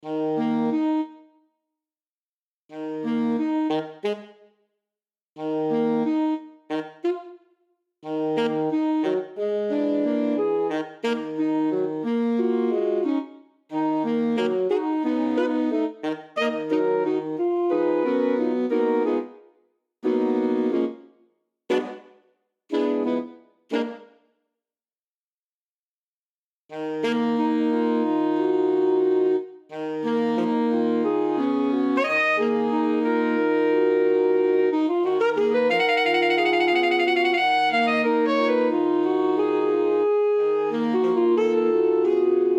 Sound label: Saxophones; PARADORNITHO